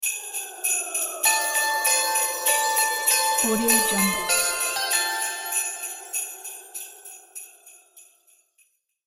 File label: christmas bells